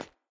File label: land metal